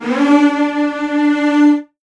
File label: D3, Virtuoso Strings; ILIO; Kirk Hunter Virtuoso Strings VOL-2; 90 s Sample CDs